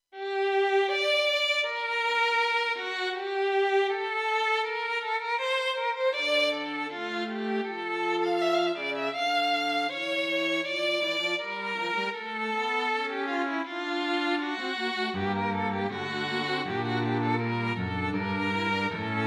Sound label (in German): Fugen in altem Stil für Streichquartett; 3 Fugen